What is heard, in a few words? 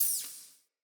Player Floppet Vocal Impact, Animals